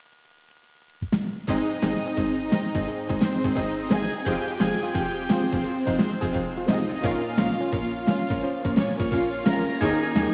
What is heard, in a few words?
closing